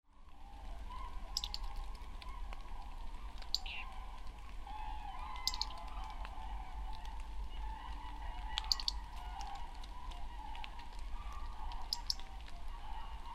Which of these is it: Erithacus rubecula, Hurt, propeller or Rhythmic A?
Erithacus rubecula